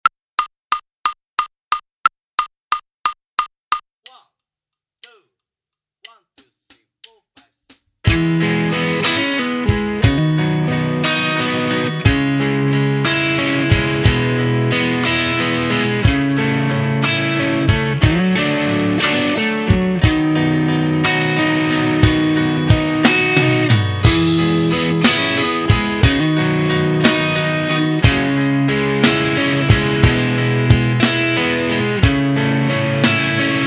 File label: Minus Bass; Inst Ensemble